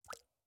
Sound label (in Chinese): 滴水石锥水滴落声